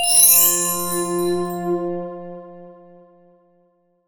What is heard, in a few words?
Complete Tone; meteorgame